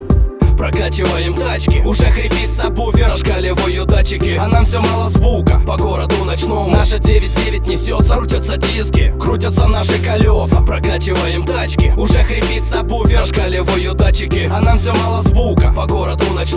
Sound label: avto tuning